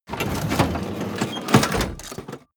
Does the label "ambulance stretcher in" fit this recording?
Yes